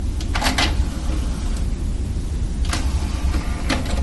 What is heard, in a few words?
dvd player ejecting, dvd eject